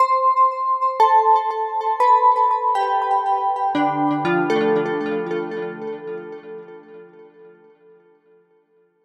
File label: Jingle